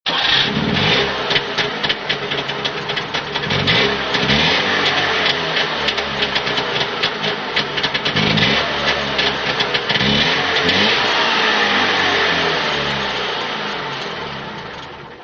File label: trabant